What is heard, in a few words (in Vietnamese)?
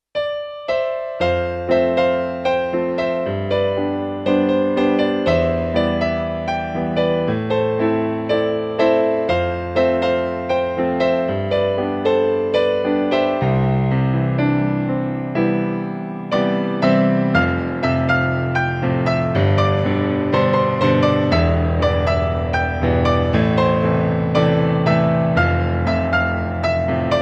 piano